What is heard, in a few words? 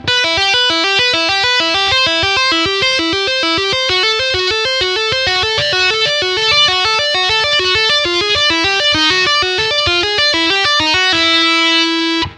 tap slomo